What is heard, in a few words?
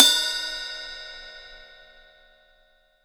crash, Crash Cymbal, mono crash; AKAI S6000 CD-ROM - Volume 3